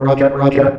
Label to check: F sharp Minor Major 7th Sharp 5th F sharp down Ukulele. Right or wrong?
Wrong